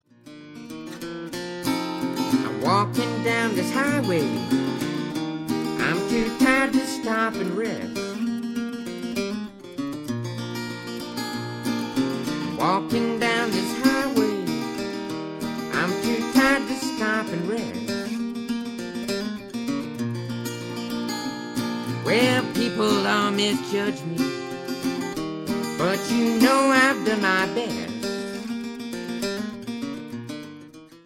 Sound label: Acoustic Track